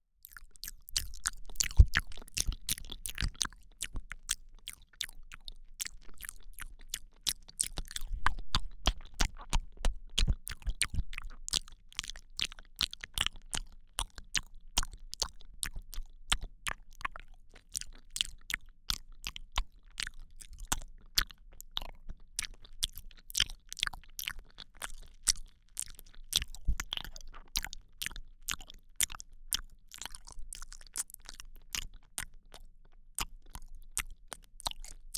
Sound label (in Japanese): 小刻みな口の音, ASMR